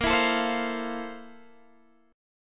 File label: F Major 7th Bb; Fmaj7/Bb; strummed